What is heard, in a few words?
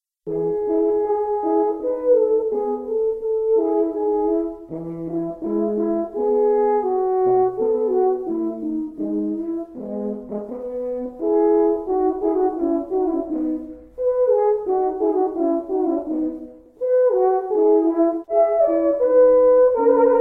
andante, 2 horns; Stich J.V.: Duet Nr. 5Andante